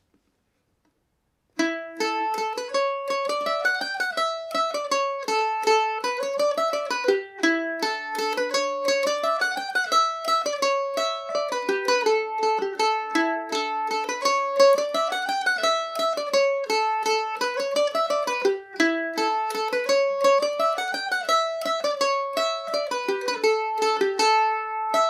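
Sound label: A mixolydian, Irish mandolin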